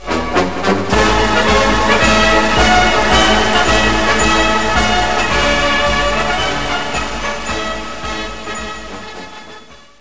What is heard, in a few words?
axis score short